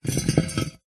foley metal heavy weight drag scrape solid hard ground